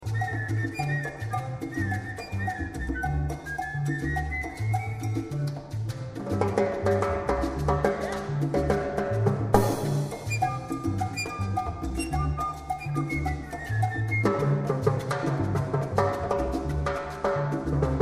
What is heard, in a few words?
flute timbal; trombanga